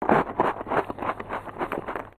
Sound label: Pet Eating; creatures pets